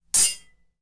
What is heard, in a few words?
Saber Clang